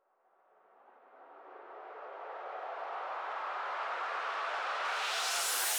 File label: FX Uplifter Noise; Multi Drum Loop 01